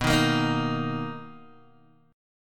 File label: B Major 7th B down Guitar DADGAD